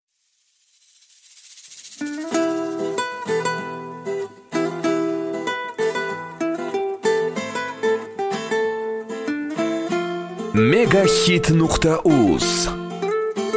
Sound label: guitar version; uzbek